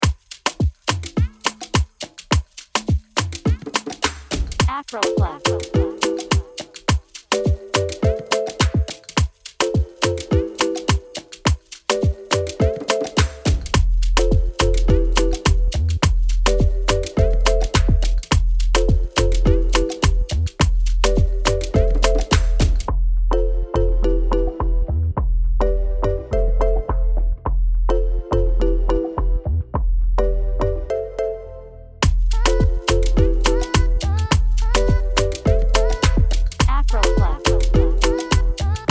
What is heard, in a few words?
afro keyz afrobeats